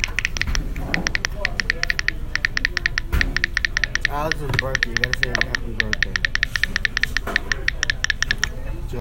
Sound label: Bb Snapple Snaps, Foley; Crowdsourced Sample Pack; TRUTH-HZ